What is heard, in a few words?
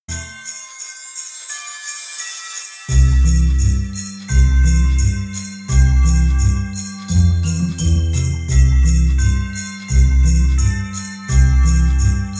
Jingling Bells; My Friend Pedro